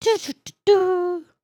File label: hat dance, emotes